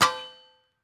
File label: mine metal